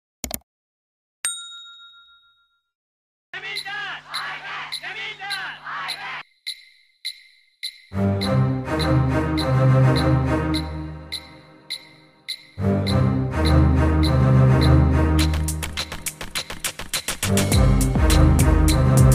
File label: villan bgm entry piano notes; ambalathar; radharavi; muthu; rajini